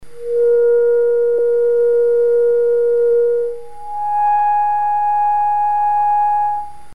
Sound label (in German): Naturseptime 4 zu 7 Frequenzverhaeltnis; Mikrobuch